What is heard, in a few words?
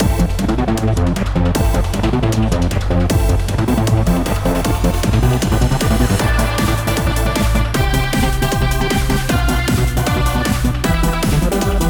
cool highway climax remake bpm155